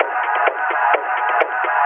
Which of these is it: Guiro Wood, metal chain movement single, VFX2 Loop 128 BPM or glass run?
VFX2 Loop 128 BPM